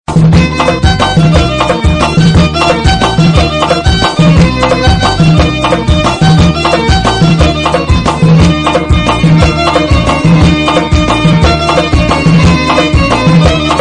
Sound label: irani kordi